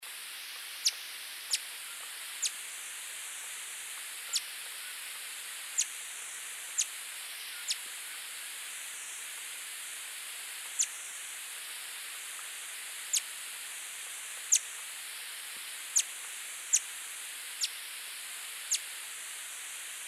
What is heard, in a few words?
Green throated Mango, پرنده